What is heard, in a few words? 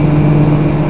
Flaps; 250 Desktop Themes